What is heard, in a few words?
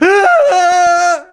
Piano - Aaa Scream; Neighbours from Hell Console Edition; GC, Xbox